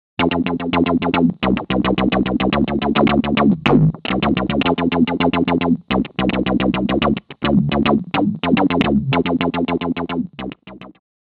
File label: Microsynth; ELH GMS